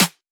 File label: G House Snare F; Drums; One Shots; Snares